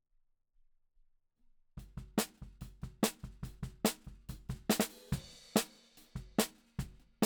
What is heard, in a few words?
Snare Bot